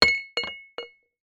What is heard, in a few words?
household hanging ornament bang against wall